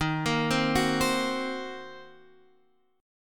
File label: D sharp Minor 6th D sharp down Guitar Standard; arpeggiated; JGuitar